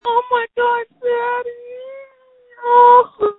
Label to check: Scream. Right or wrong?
Right